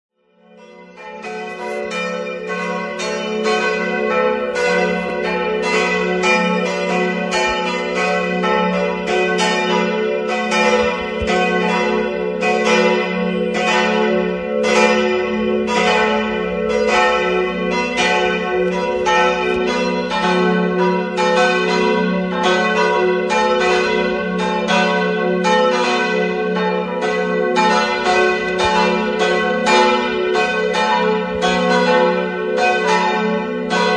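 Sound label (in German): Tonaufnahme fis1 a1 h1, mehrstimmige geläute; 1954; BVG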